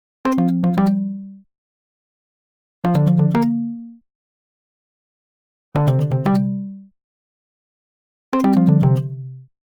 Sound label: Arcade Jingle Neutral; boom library; The Interface